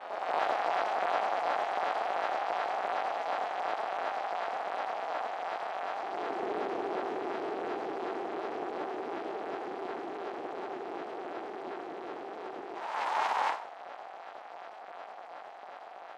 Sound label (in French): boite alumettes